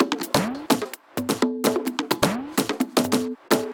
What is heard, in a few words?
Drum Loop 128BPM Perc; VEC5 Drumloops; VEC5 Multi Loops; Loop Elements